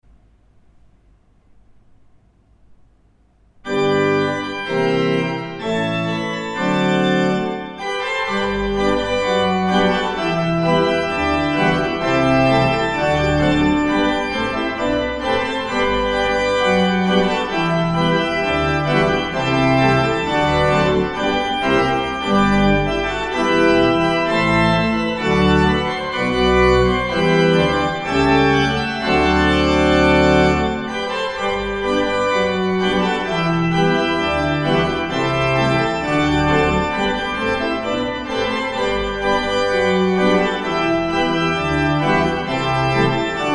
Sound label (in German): Orgel; Popmusik (Orgel)